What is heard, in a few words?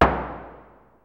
LOW DRUM; DRUMS & PERC